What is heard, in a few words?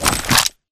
battery pickup bright; Titanfall 2